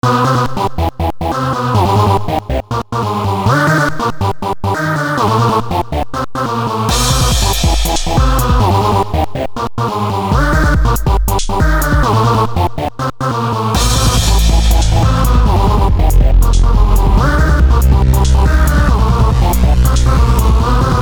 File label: dark beat